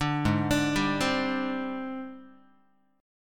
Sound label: Ab Major 7th Flat 5th Ab, down, Guitar; arpeggiated; JGuitar